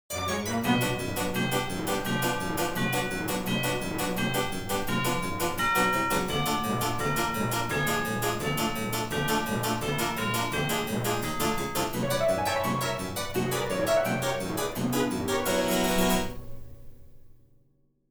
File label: Music Cue harpsi